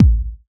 house kick single hit a key; kick drum samples

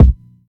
kick sound c key

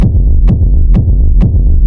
Techno Sub Kick Black Industrial; drum loops

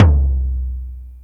mid tom drum single hit c key

